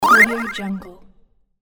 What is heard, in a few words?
cartoon movement appearance inspiration